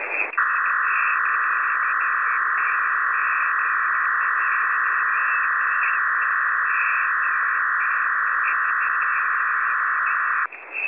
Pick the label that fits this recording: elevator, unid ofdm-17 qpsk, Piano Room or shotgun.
unid ofdm-17 qpsk